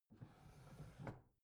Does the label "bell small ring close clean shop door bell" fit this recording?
No